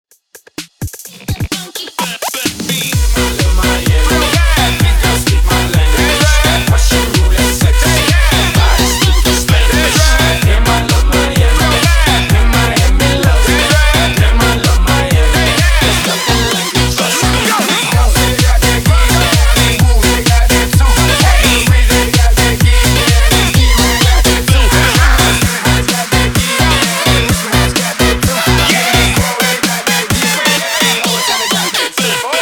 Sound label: LEADER MIX 128 BPM